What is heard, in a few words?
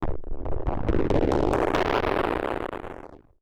SPACE FX 140; SpaceSounds